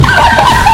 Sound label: world record sound; ff2